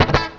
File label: FX10, FX guitar; USB Soundscan vol.31; Miro s Grooves; AKAI